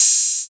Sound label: OP HAT; East West - Phat & Phunky CD2; 90's Sample CDs